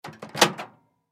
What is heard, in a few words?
Microwave Door, Microwave Door Open; Household Microwave Door Open Appliance; Microwave Doors; Blastwave